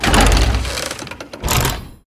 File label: Target Dummy Enter Play